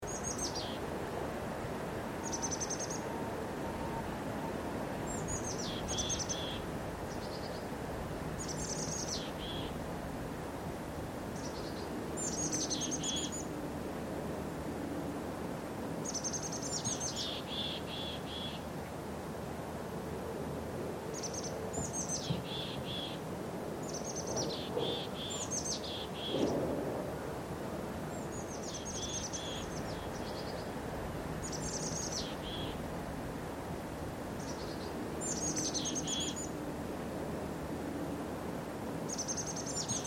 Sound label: Spring nature ambience birds insects